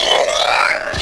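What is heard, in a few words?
engine loop; vladimir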